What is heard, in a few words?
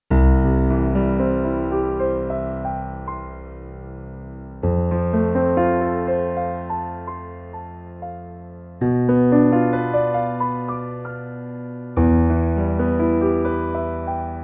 NEVE1073 Piano